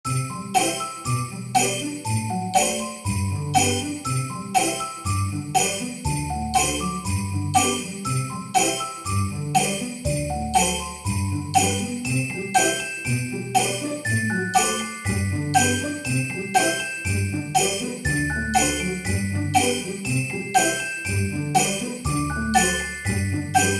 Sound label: PIZZICATO JAM